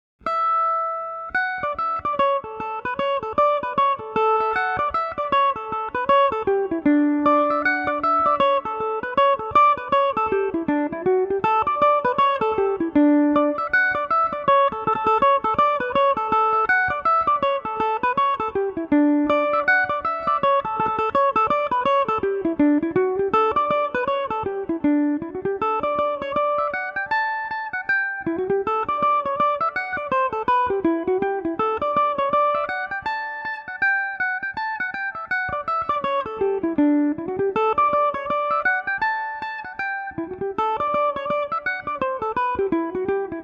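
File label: D major revelation, irish mandolin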